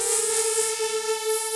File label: technosyn2, Techno Trance; Ueberschall; SYNTH